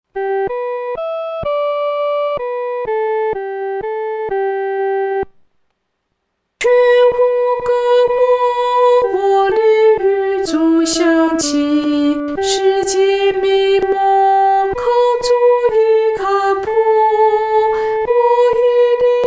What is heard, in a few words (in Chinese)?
独唱 第一声; 全无隔膜